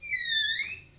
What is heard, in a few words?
Creak